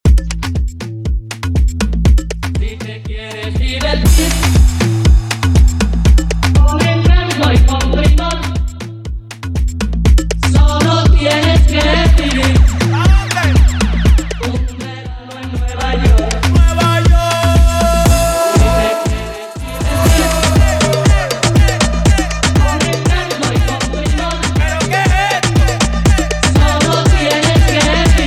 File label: Intro Outro 120 BPM